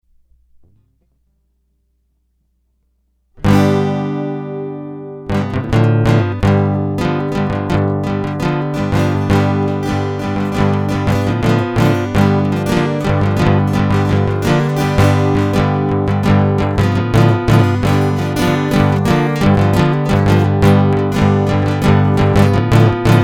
Guitar Loop

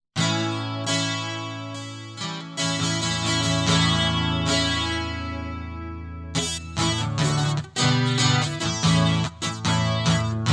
Guitar

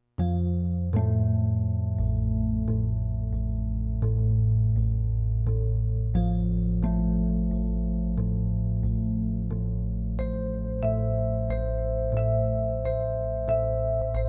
Am Electric Piano; hyper terrestrial

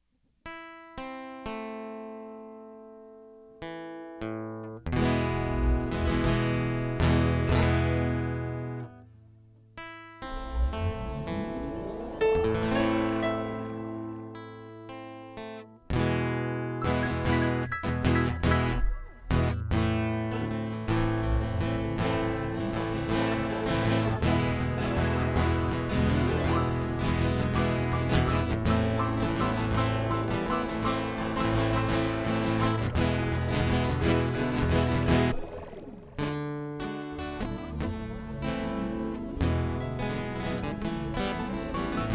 Prelude; hyper terrestrial

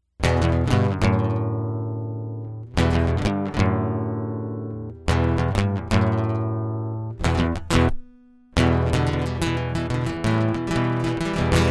Guitar Loop

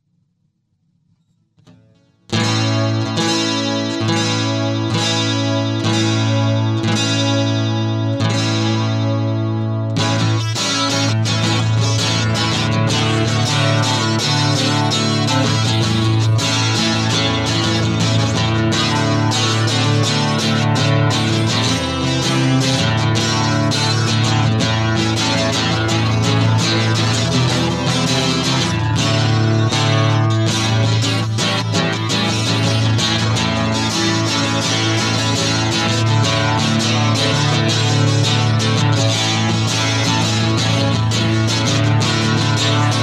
Guitar

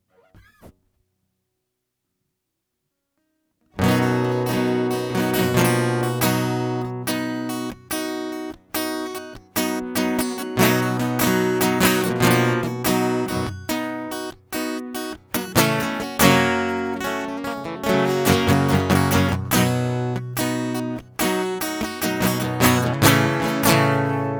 Roll Prelude; hyper terrestrial

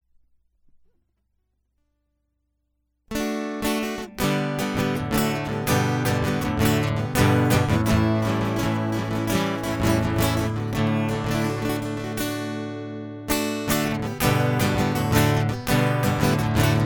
Guitar Loop

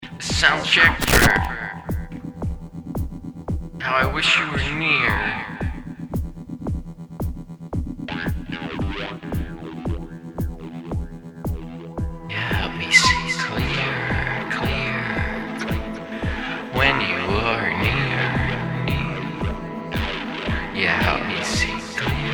Soundcheck

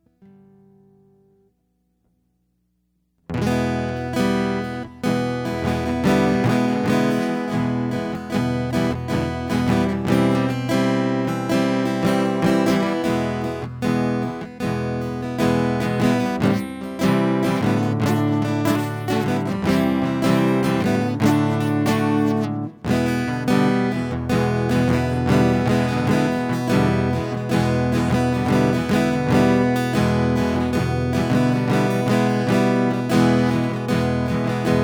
Instrumental

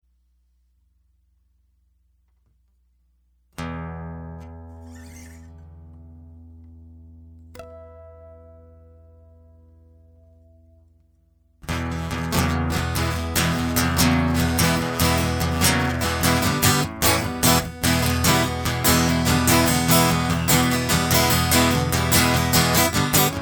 Guitar

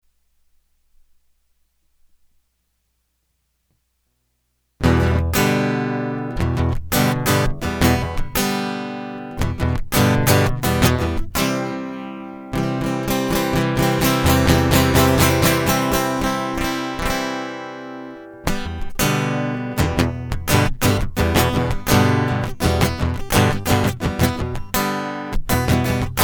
Guitar Loop

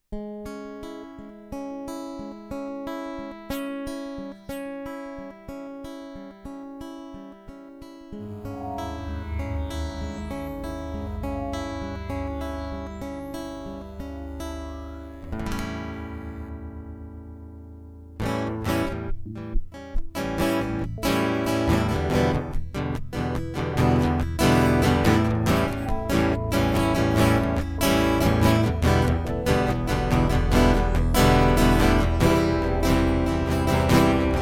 Interlude

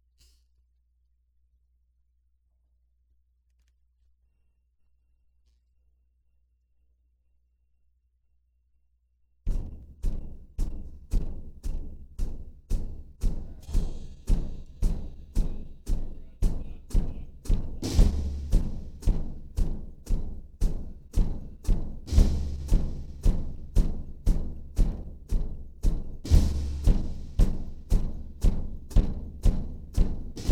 Drum Interlude; hyper terrestrial

